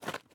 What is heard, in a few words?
ice step; teardown; ps5 windows xbox series xs